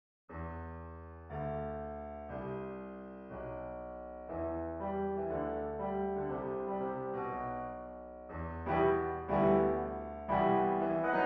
swing

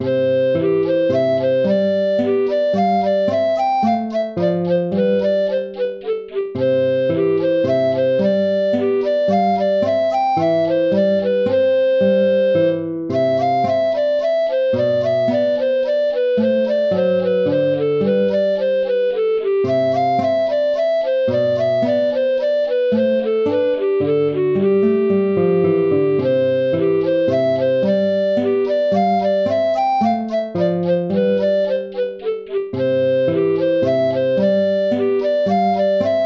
tempo minuetto; hook james

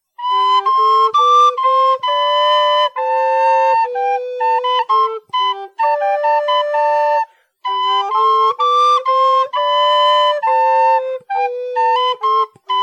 gavotte; hook james

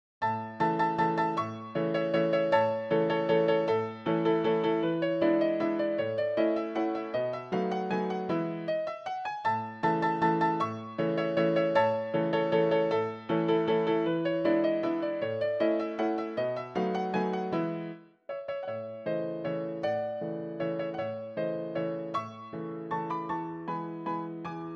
spanish dance

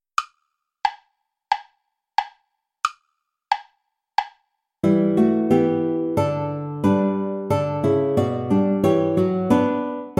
play along without guitar

